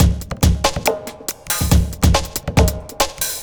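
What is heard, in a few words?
industrial 140 kit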